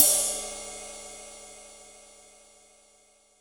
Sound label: Single Cymbal Hit D Key, Royality free cymbal sample tuned to the D note. Loudest frequency: 9251Hz